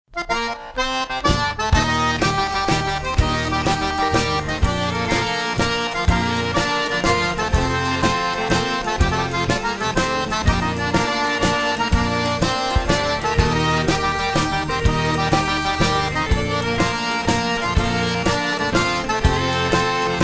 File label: Waltz